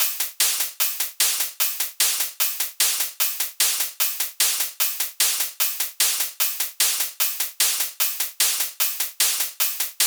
Top Loop 150; spectrum; Regular

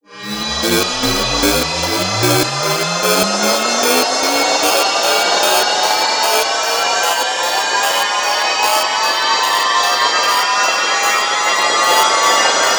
Riser 150; shimmer; Chipbase

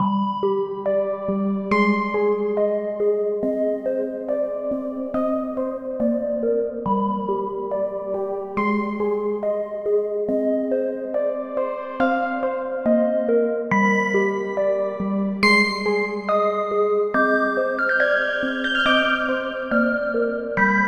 keys

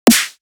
Snare; horizon

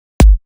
Kick; horizon

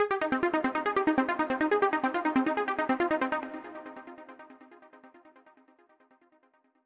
arp trance

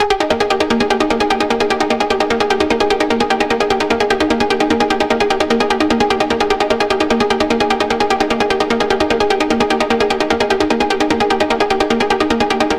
Seraphim Arp 150 Eb maj; shimmer

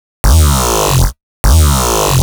growl herculean horsepower; bossfight

